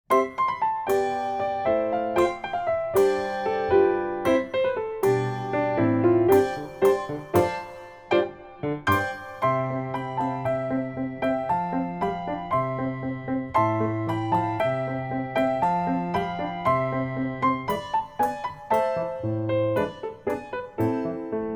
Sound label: How Great Joy with Handbells